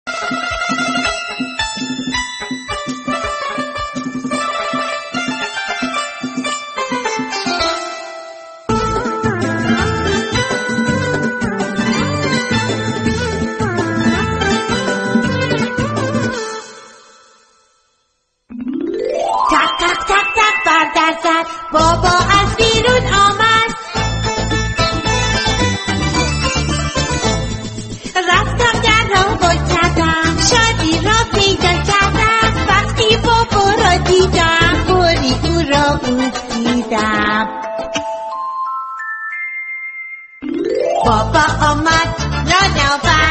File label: fathers day music